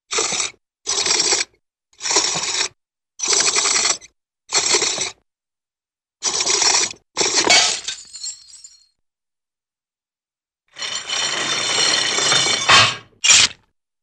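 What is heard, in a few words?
Wooden Pulley Twists with light Metal Squeaks - Metal Chain; Cartoon; Hollywood Edge; Cartoon Trax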